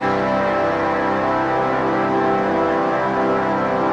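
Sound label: 122 BPM Pads C major A minor Tonic G, ambient synth pads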